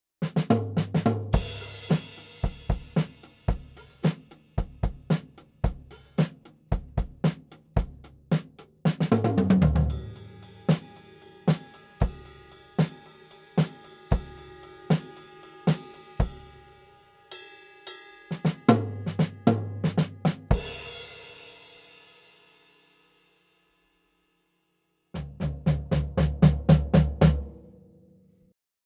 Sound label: Coles 4038 Front of kit Drums